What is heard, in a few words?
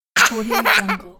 childrens laughter